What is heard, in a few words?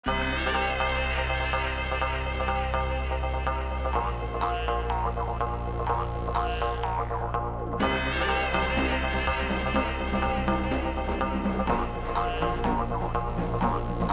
BGM; PAPER MARIO Color Splash; wiiu